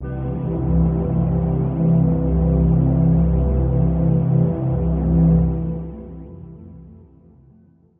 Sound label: calm, Synths